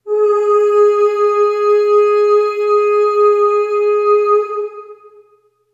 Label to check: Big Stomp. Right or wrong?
Wrong